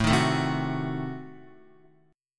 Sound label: A Diminished 7th A down Guitar